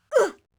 hostage pain

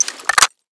bullet in; weapons; INS2

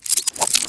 knife inspect end, Balisong; Balisong1; knifes

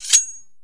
knife deploy, Balisong; Balisong1; knifes

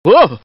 fear

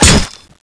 knife stab; Turbulent

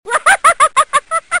"haha"

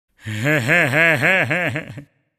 "hehe"

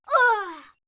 hostage pain

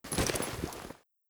shield up; Balisong1; knifes

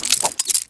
knife inspect; Balisong1; knifes

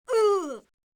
hostage pain

